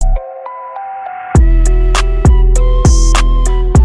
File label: type beat